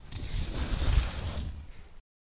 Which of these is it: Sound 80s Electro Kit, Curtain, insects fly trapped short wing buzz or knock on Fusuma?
Curtain